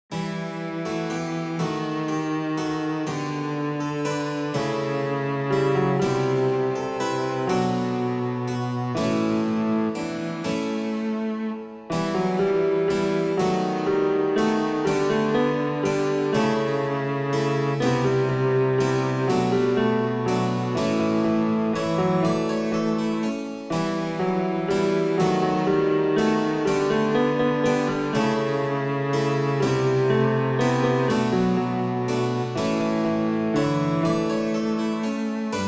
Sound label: solo